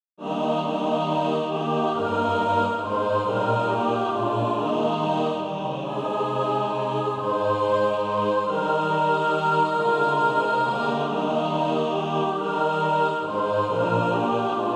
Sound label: mixed